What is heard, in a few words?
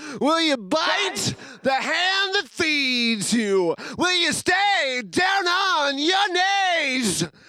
lead vox